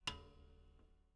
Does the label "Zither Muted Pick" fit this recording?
Yes